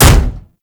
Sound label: Contact Hit Effect, Vehicles; Warframe; Archwing